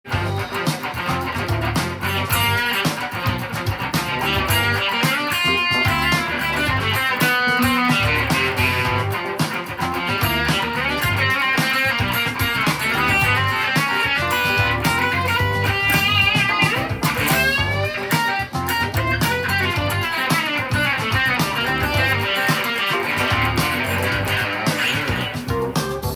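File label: over delay, guitar; japanese-made guitar effects with multi-effector; gin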